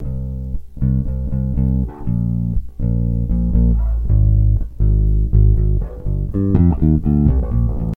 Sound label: Precision Bass